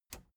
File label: plastic button latch loose release enable